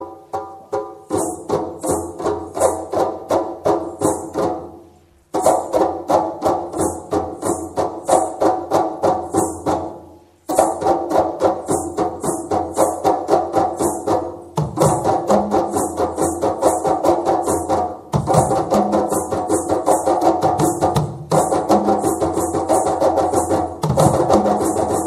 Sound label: djembe drum; ringtone